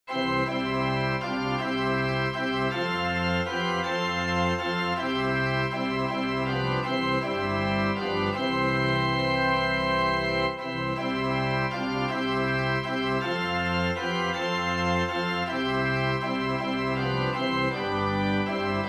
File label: organ; Sweet Hour of Prayer